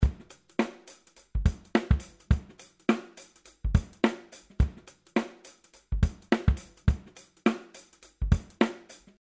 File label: loop Dyn EQ snare